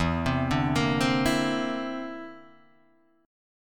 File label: D 7th Suspended 2nd Sharp 5th E